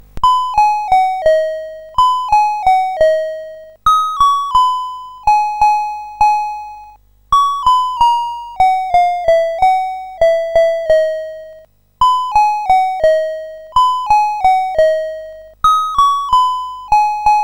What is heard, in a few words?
sid, CSG 8580